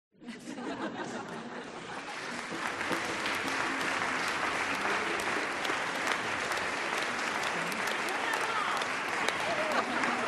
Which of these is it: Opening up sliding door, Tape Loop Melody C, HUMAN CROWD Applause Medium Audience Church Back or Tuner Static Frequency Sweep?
HUMAN CROWD Applause Medium Audience Church Back